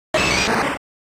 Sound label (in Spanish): Grito de Machop